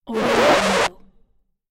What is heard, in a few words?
scratch